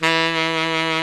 TENOR SAX

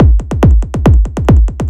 Fill, Drums, Eclectic Electronica

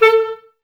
Wood Winds Clarinets; Miroslav Vitous - Woodwind Brass Ensemble

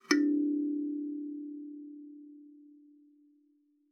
KALIMBA D4; Xsample VOL-7

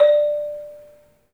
MARIMBA, D; 3D Sound; 3D Keys VOL-1

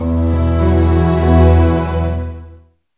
CLOCK; East West; Scoring Tools; ORC; Clock Time

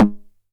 909 SD Tape

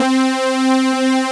JP BRIGHT, SYNTHS; Pro-Rec - Synthsonic

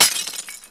Glass, Foley; Foley Fx; cartoon; musicradar; cartoon caper

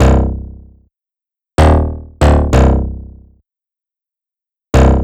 Bassline; Drums & Drones

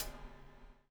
BUBL, BUBBLE; Spectrasonics - Backbeat

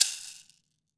wood shaker

